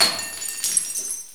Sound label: Ice Monster Die